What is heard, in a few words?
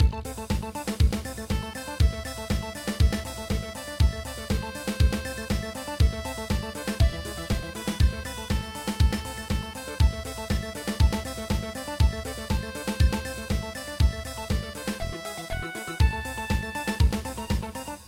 trance, midi